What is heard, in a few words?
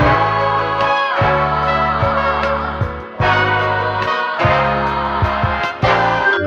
horns and voice follow me to the lake, Loops Phonky